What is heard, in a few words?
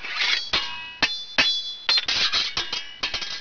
swords; Apache; Roastpork OEMs; Optional PocketHumanity